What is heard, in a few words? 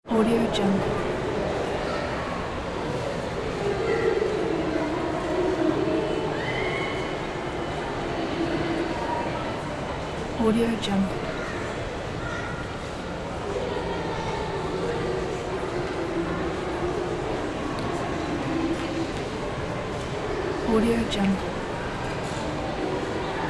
mall ambience